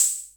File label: HA64 HHO, Hammond Auto-Vari64